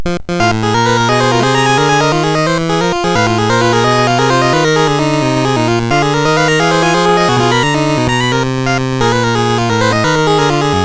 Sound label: Blues, MOS6581R3